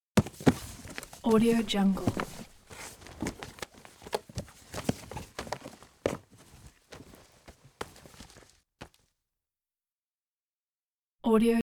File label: cardboard carton